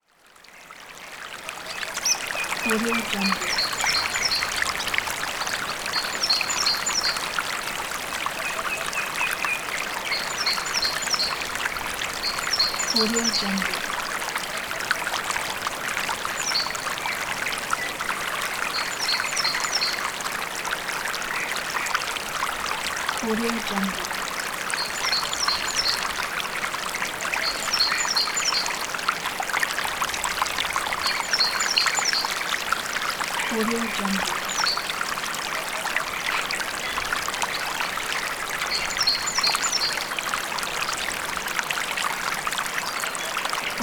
forest river; sounddivision

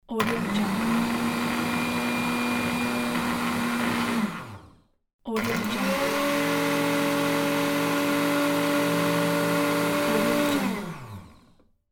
blender; medusamusiclab

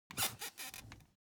object chair office creak; smartsoundfx

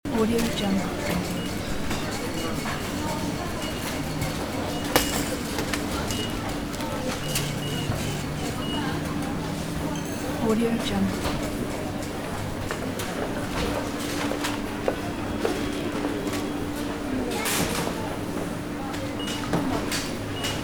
big supermarket ambience loop; fxprosound

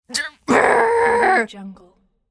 teenage girl anger frustration sound

